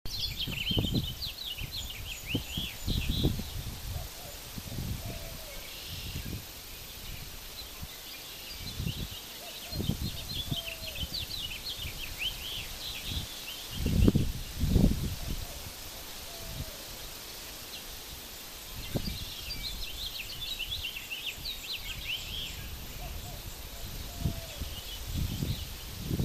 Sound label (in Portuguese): Canto do corrupiao mascarado